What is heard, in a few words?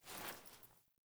Foley Action Default Block Remove, Foley Default Clothing; Characters; Player